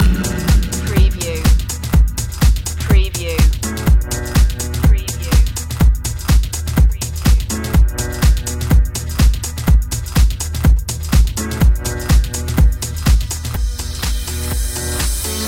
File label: systemic beats loop